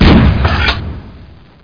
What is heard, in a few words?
grenade; CHASM